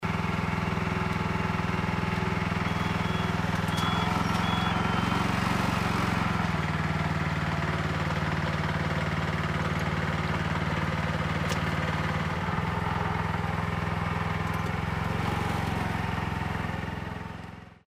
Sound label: tractor rice planter